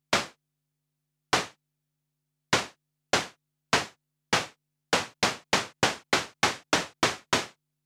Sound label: Syn Elec